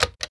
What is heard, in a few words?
click